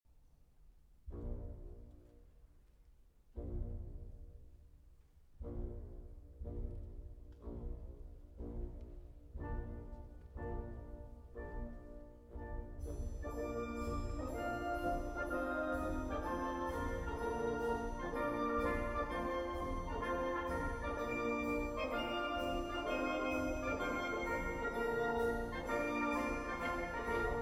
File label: Symphony in D Minor, Choral, Alla Marcia, Allegro Vivace Assai